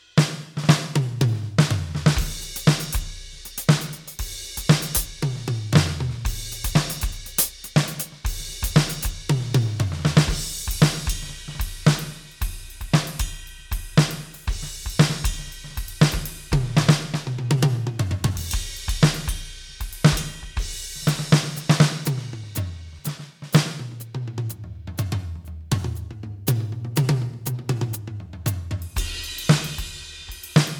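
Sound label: 70s Rock Kit, drums; GEWA G3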